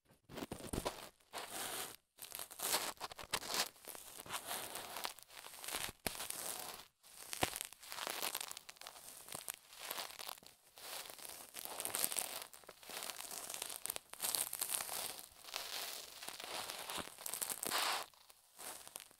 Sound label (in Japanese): 繭玉 耳かき; KU100; 母性溢れる甘々囁きでじっくり癒される【耳かき・マッサージ・抱きしめ・睡眠導入】